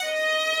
violin

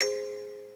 musicbox

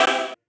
choir

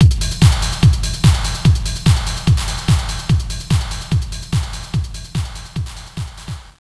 drum; Angelic Layer

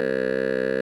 小林 bass; 音MAD; 小林家的龙女仆

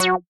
synth

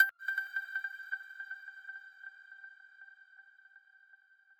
arp

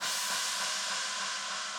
cymbal

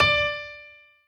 piano echo

